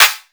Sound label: CLAP; KULTURE; JUMPUP